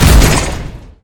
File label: heavy step